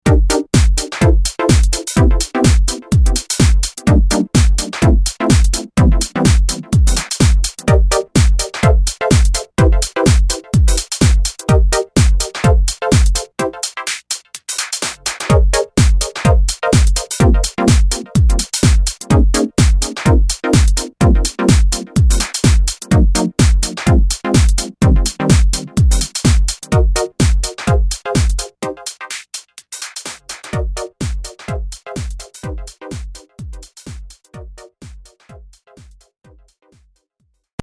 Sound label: EMX Chord